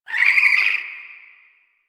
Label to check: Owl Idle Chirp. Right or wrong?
Right